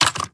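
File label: Equip Movement; Valorant; ares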